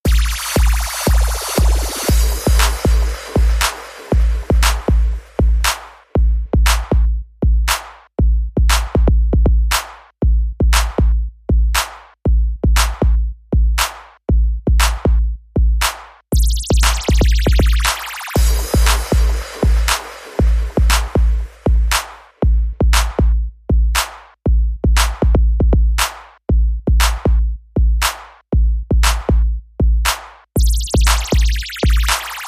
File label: Horny Beat